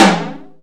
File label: SDX FLANGE TOM; Greytsounds VOL-1; SDX TOMS